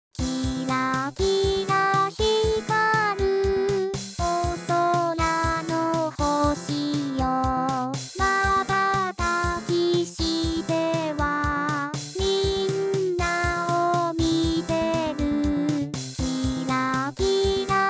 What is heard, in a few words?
twinkle twinkle little star